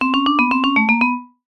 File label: cartoon vibraphone descend; zapsplat